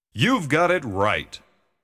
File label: You've Got It Right!, jingles